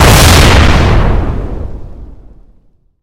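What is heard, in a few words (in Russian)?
воздушный ослепительный взрыв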